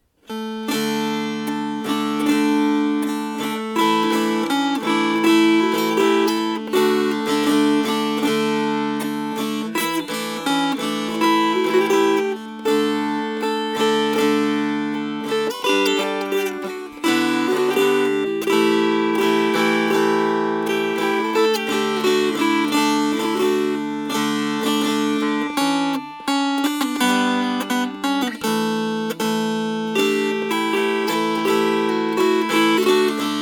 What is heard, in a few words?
Strummed